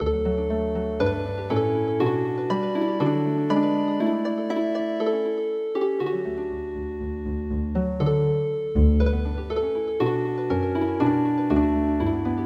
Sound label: January First Healing Harp